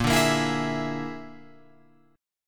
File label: A sharp 7th Flat 5th A sharp down Guitar Open F; A#7b5 strummed; JGuitar